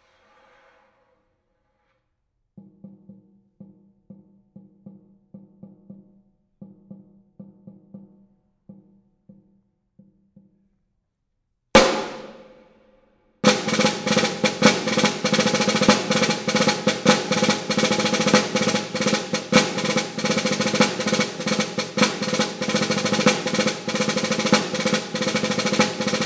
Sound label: Waltz